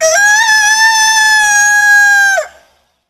clock ring

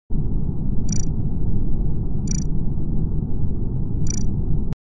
selecting SOUND EFFECT; Among Us

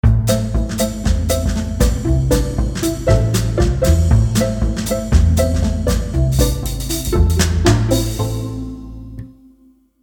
Jingle

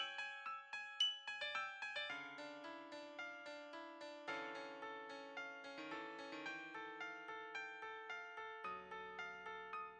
arpeggio

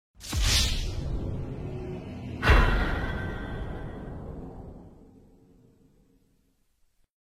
Mission Failed Sound; GTA 5

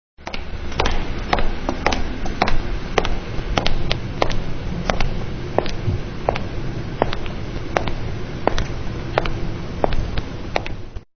steps

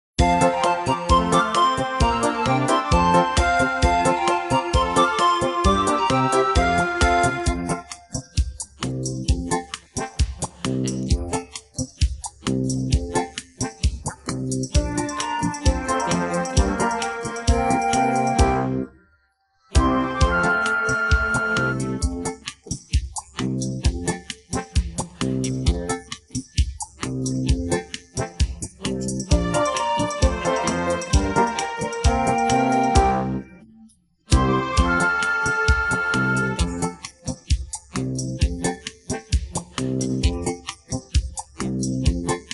accompaniment

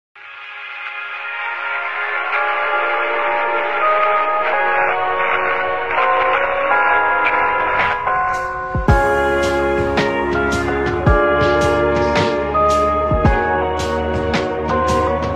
Lo Fi hip hop remix; Over the Garden wall

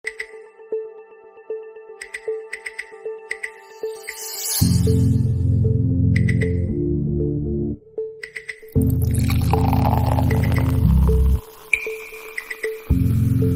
Conference Ident Intro; Coca-Cola; Minimalist Stage Visuals; Projection Map

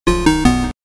error sfx

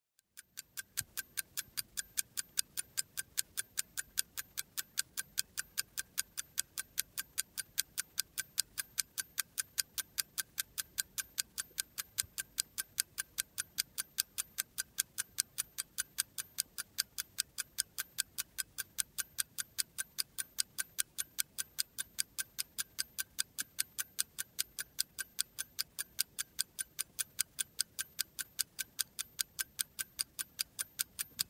Sonido reloj tic tac Tic tac clock sound

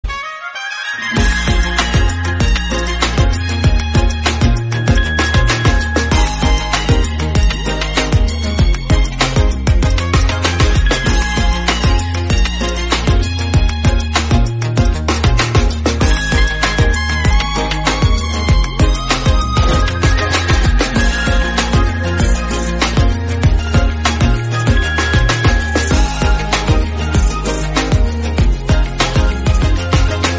Instrumental Song

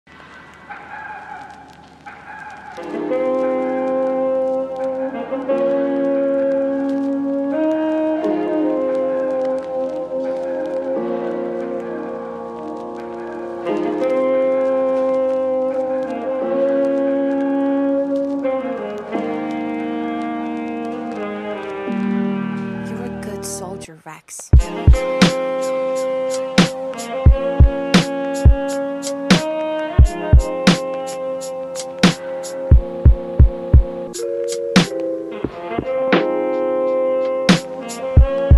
lofi

